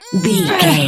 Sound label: voice female effort quick action